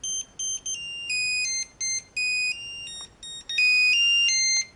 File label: nokia 3210 hip hop ringtone